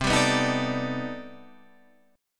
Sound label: C Minor Major 13th C down Guitar Open D